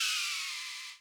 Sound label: crash, Millenium MPS-400